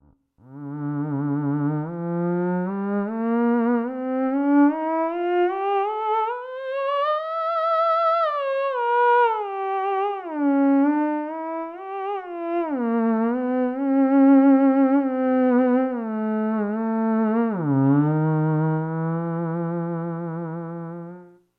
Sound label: ews resonator, theremin